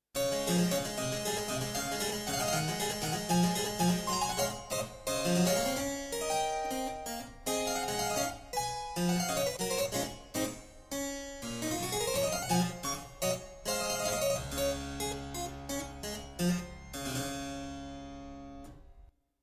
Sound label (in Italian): cembalo